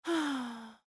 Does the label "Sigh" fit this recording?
Yes